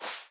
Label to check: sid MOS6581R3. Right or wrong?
Wrong